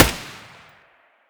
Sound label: scar muzzle mono distant